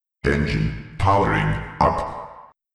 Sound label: powering up